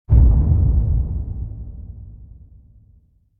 Impact Deep Strike - Single; Hollywood Edge; sound designer's toolkit v2

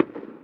firework single; best service Blue Box

